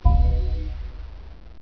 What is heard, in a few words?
beep